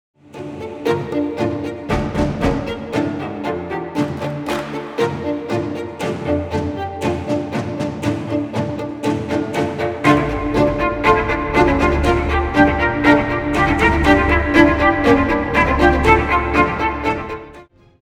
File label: Neoclassical Trailer Music